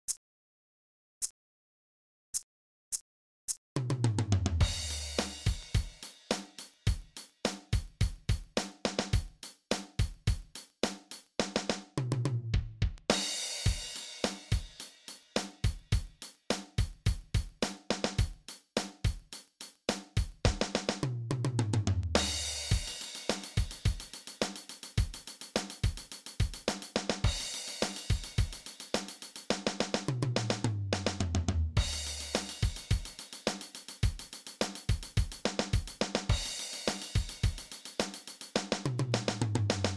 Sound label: Drumstyle